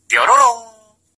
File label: Baam